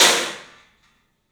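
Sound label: club cymbals; 3D Sound; 3D Drums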